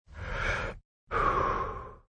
Puff, Cigarette or cigar smoking - Inhale and Exhale; Breathing; Human; Cigarette or cigar smoking - Inhale and Exhale - Variation 2